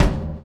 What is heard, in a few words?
Kick, drums; Sonic Foundry (Sony Creative Software); Drums & Drones; Construction Kits; Ventria Kit